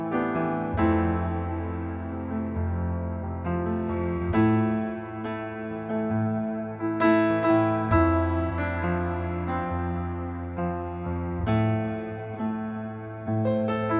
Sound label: piano; SiSEC11